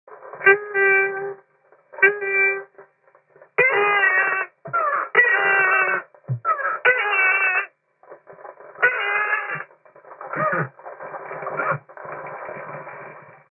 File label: beluga as sax mix